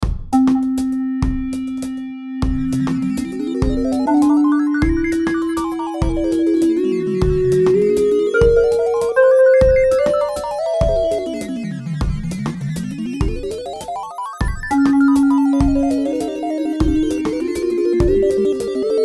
Beat Delay Blips Video Game Synth; Miscellaneous Loops

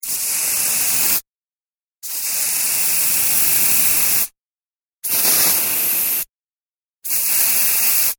household aerosol can spray; Spraying; Objects; McKinney Sound

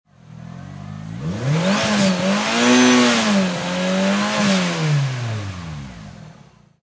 Mitsubishi Eclipse Engine Triple Rev; Auto, Power Car, High Performance Car Sound: Mitsubishi Eclipse; Engine perspective; Triple rev, motor revving; Lynne Music

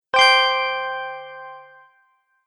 Magic Chime Button; Button Sound Effects

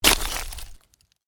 Ice impact medium, Ice: medium impact with debris; Snow Sound Effects; Snow (Sound Effects:Weather); Stuart Duffield

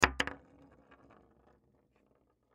Bullet Shell; Bullet Shell 12 Gauge Drop Wood Roll; Drops (Sound Effects:Objects); Blastwave; Warfare

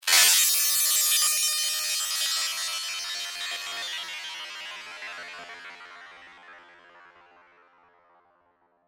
Scifi, Digital, Sweeper, Science, Fiction

